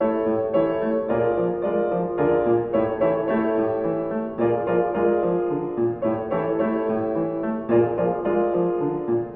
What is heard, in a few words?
Piano, Waltz; Counter-Strike: Source